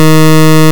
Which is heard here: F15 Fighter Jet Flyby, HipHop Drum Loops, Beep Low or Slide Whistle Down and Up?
Beep Low